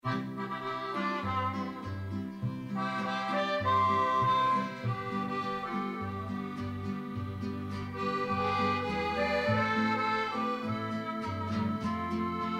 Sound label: Mariachi